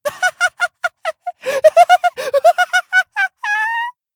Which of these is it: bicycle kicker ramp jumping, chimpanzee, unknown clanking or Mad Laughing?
Mad Laughing